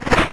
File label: Double Kick; pokemon reborn e19 2022